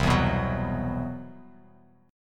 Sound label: C Major Sharp 11th, Bass Standard; CM#11 strummed; JGuitar